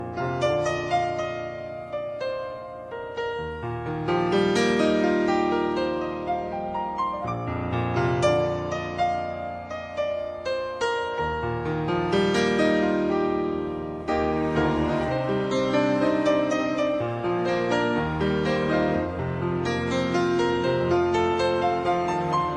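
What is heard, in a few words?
instrumental, ringtone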